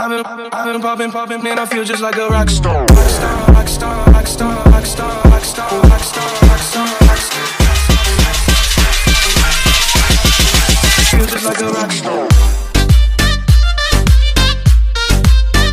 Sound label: english ringtone